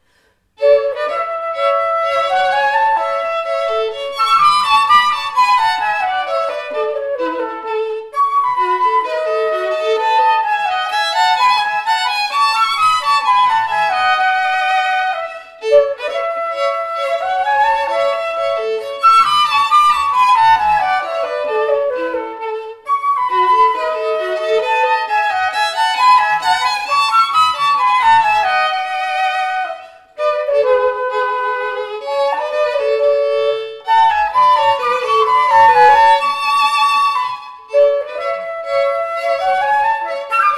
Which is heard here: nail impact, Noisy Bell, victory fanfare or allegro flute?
allegro flute